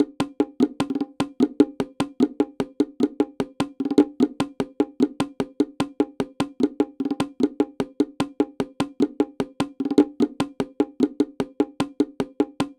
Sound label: bongos